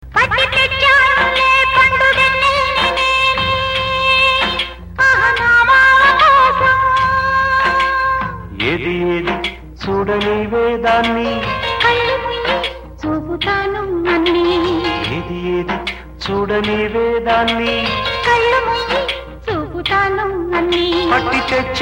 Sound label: telugu melody